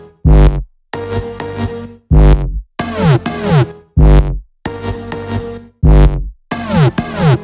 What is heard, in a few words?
129BPM Melody; Vengeance Samples; Micromax Kit